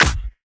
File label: Dirty Dutch Clap; Mutekki Media; Vengeance Electroshock Vol.2